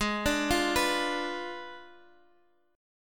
G sharp Diminished 7th G sharp, Guitar Half Step Down; G#dim7